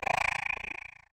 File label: spider death; Minetest; VoxeLibre; mobs mc